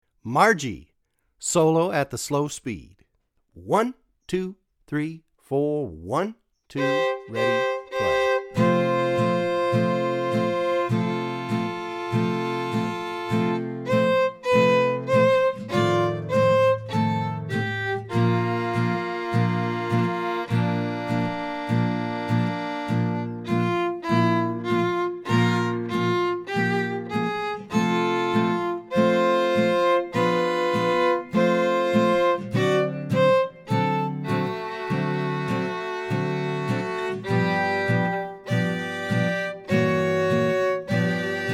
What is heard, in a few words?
Solo Slow, swing jazz; Swing Jazz Violin with Hot-Club Rhythm